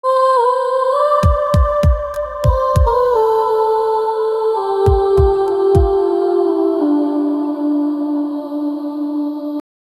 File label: a sophisticated deep house